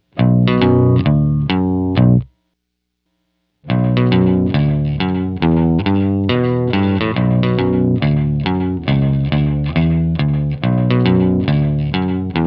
reflections; BigSky; reverb; Muensters

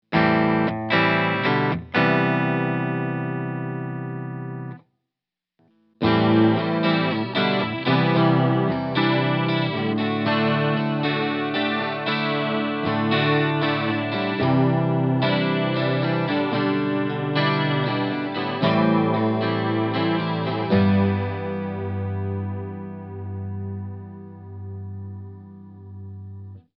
Half a Chorus; In Split mode, a chorus on the Right channel creates a stereo effect against the dry (bypassed). Left channel.

